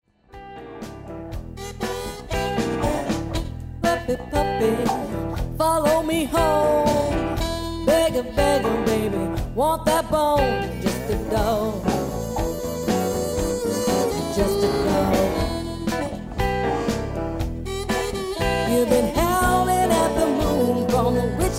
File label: Blues